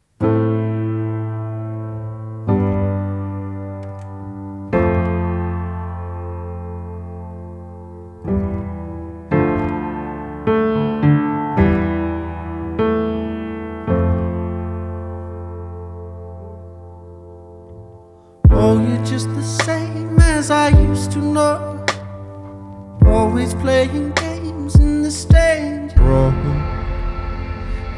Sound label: Live Acoustic